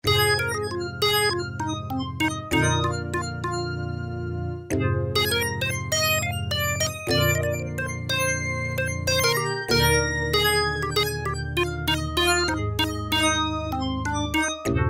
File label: NEW RAGE, Casio VZ-10M; Internal factory combi